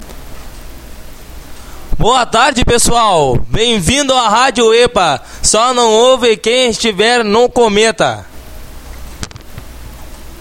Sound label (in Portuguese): vinheta